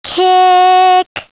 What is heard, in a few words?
kicks